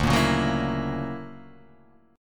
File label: D Augmented Major 9th down, guitar; D+M9 strummed; JGuitar